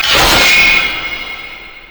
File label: sheath spark; LoL; yasuo base